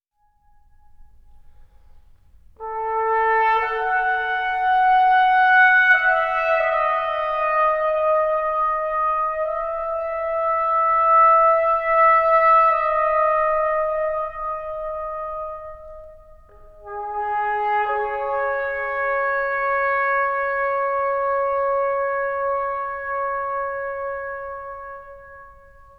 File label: Trumpet Solo; Title Cards Ep01